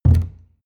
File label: canister full put down